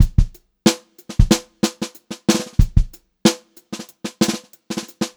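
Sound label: groovy variation, Funk-Groove Drumloops; USB Soundscan vol.38; AKAI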